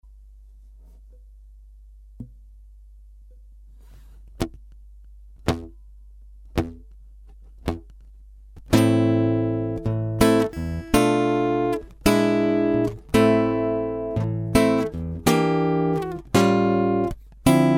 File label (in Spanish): Blues menor